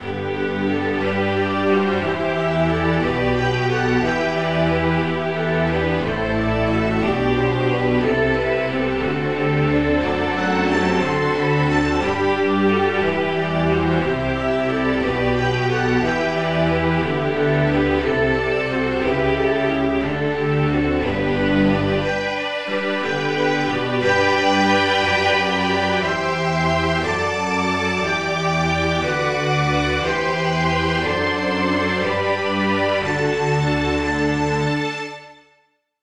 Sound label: strings